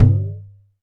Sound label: DM5 Perc, Alesis DM5 drum machine